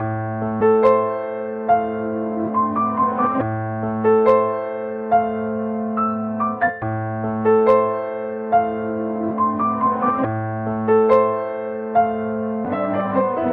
piano, Sad

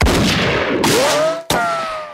gun richochet; Crash Bang Boom

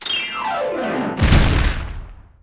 whistle down piano

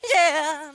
"yeah", sung; ooh ahh

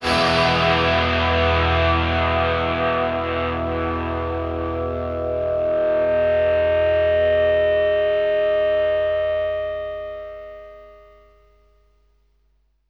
dead ringer, Notes, Guitar

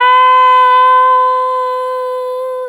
"otal", Voice

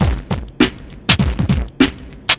distorted, funky drum loops

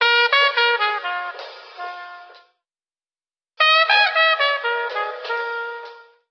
horns, Loops

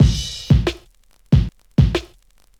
Drum loops, Boombap

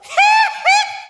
hee hee, laugh; ooh ahh

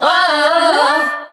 "woah" SUNG

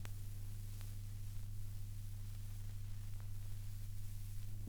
line noise, Vinyl; Misc Percussion

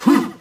"hoo", voice

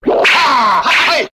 scream martial arts; ooh ahh

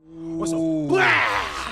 woo bloaw; ooh ahh; ho hoo woo

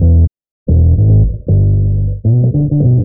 bass, Loops, Phonky

